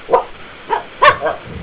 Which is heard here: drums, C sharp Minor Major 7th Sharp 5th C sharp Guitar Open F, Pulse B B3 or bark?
bark